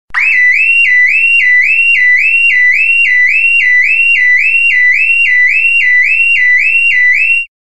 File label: car alert signalizaciya